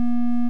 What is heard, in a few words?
boop